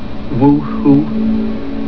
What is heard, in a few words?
woohoo